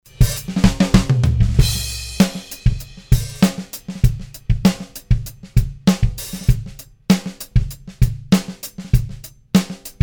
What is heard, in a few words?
Drum LA610 More Over Gain Tube